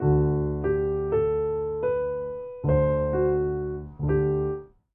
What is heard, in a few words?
Piano Sequence; mass effect 3 citadel gamerip 2013; Silversun Strip